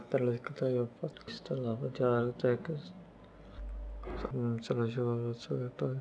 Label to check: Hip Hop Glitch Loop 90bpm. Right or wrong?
Wrong